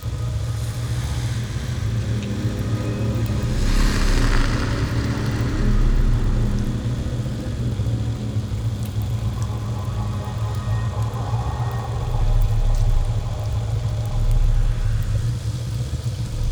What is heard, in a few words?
ship corvette idle; Stellaris; toxoids; ships